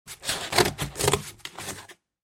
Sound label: Scissors cut cardboard